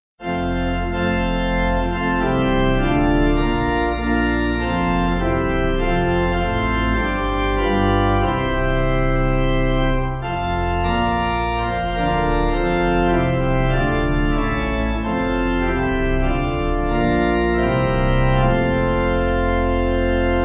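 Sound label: Pipe, Pipe Organ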